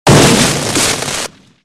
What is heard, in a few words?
lightning hit; cof